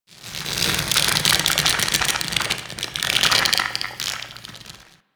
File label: Infested Tendon Stretch; Warframe; Corpus Infested